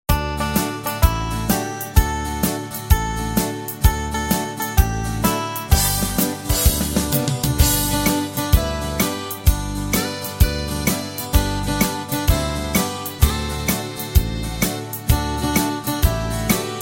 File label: music